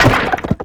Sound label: Shield Broken